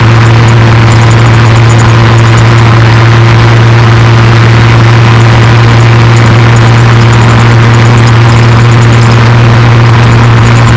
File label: l6 petrol medium, engines; acf engines